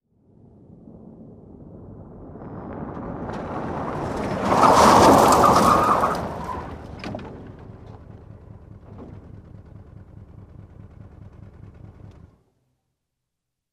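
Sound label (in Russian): avtomobilnye tormoza, car brakes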